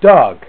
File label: Dog; Old MacDonald